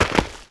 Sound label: step; xatrix; gek